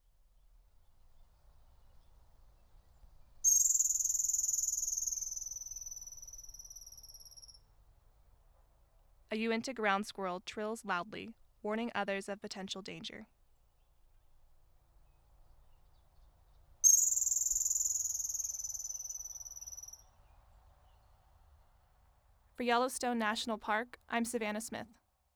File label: uinta ground squirrel; sound and light ecology team